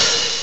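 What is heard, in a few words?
Hi Hat; AMOS MUSIC 1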